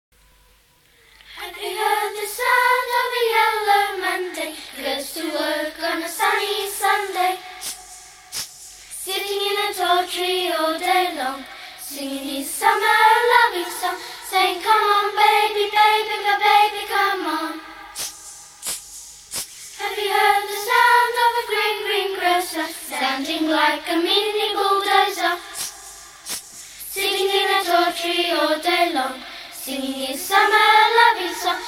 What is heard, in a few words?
Cicadas